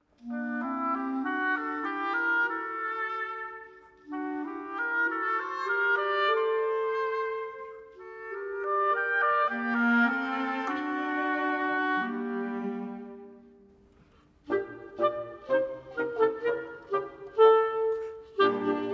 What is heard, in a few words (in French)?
instrumental et melodie